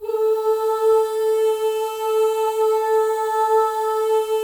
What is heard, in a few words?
MOVING V1, Symphony of Voices; Spectrasonics